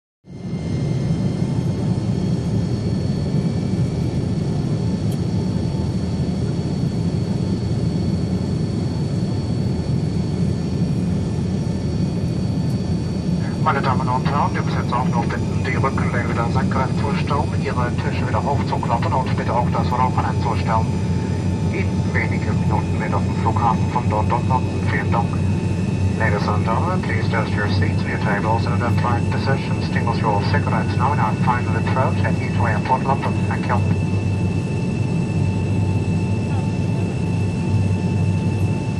Jet; Interior; Interior Boeing 727 In Flight And Landing. Passenger Talk And Flap Noise At One Stage.